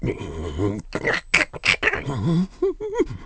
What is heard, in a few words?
Grumble; Barnes grumbling about beasts from inside the Kakariko Sanctuary